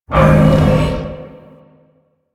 Survival Spirit Impact; Warframe; Zariman

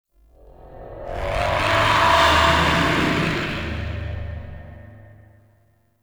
Sky Screech; Exterior; Ambience; Duviri; Warframe

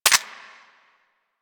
Tranquillizer Rifle Mech; Warframe; Tenno

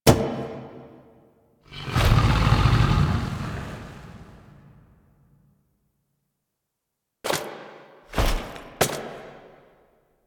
Idle Noble Speargun; Warframe; Voruna; Idles Noble

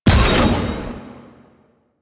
Exalted Sniper Cast; Warframe; Warframes; Frumentarius

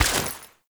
Step Water, Footsteps

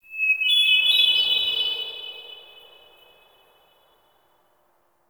Wetland Forest Hermit Thrush

